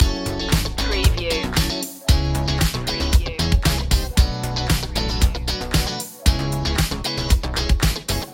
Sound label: showtime loop